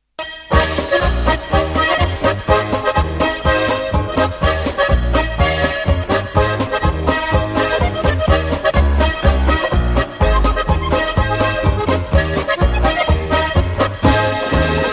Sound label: Jingle; Audio Fragmenten; Arkan Radio 2